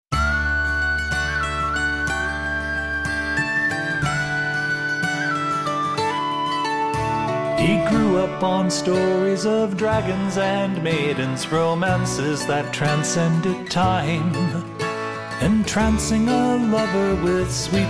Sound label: Hopeful Romantic